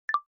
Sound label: Video Stop, ui